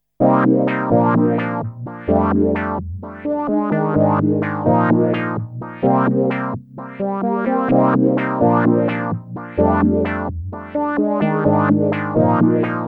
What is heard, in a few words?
esq1